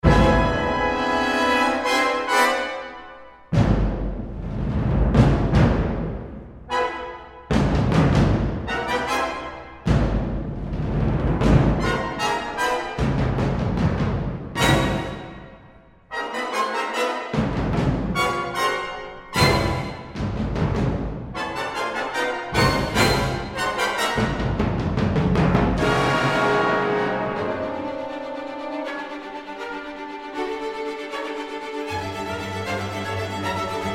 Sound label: PERCUSSION CONCERTO